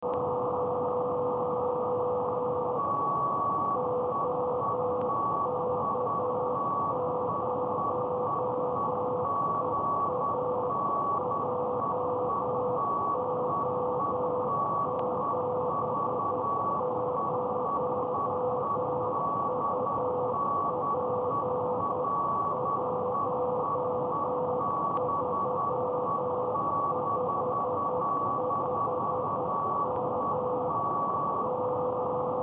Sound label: beacon test 500 Hz; ic7700